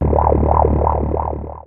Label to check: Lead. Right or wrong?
Wrong